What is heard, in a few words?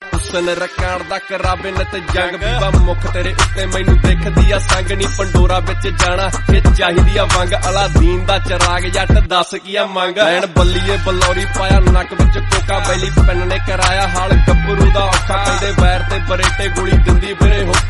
Punjabi song